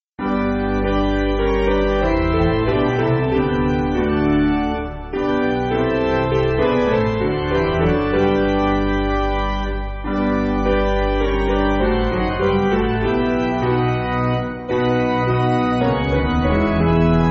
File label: Piano